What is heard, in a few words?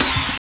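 snare drum, Drum & Bass, 160 BPM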